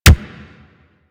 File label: Pulse Laser Punch; Railjack